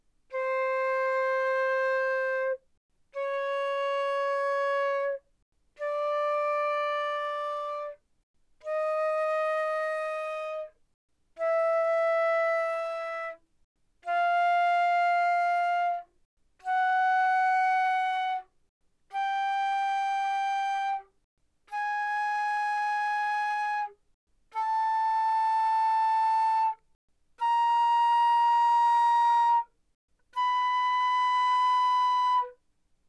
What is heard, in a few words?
flute novib mf C5 B5